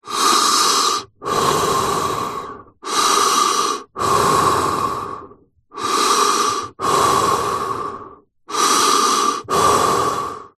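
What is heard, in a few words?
human person breathing inside motorcycle helmet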